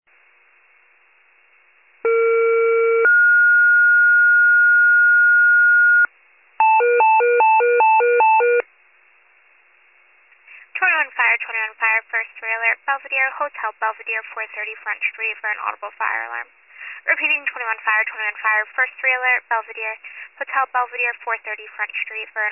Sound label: fire, pager alert